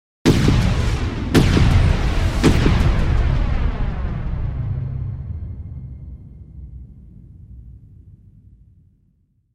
3 Hits 165bpm; Millionaire Soundtrack

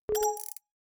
Windows User Account Control

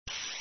Rest Up, Windows; Whistler

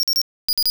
Alarm, 8bit; first generation iPod sounds; i OS

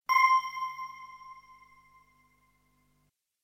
Lifeline Ping; Millionaire Soundtrack; UK Revival (2018)

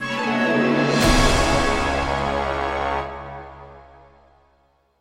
Lose; Millionaire Soundtrack

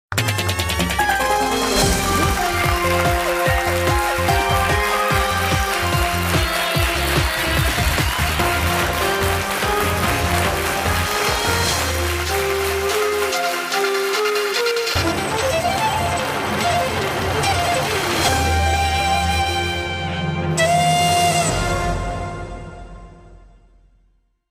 Fanfare; KBC S7-S9; Millionaire Soundtrack; Indian Soundtrack [2011 - now]

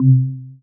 progress; VoiceView

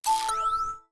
Windows Logon Sound

